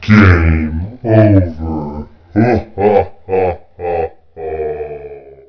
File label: Game Over; Denkspiele; Diabolica2